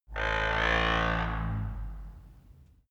wood door old creak

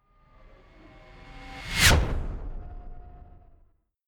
fly by hover car hum high

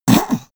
sniff back; koerpergeraeusche; menschen; soundpool

